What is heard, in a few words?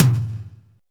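tom, real mega drums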